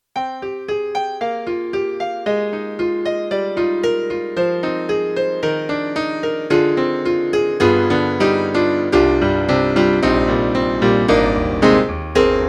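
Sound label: YAMAHA MDP-5 XG Grand Piano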